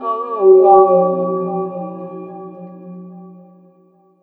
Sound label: OH HO OO, ADLIB WOM, Funky Vocals; USB Soundscan vol.18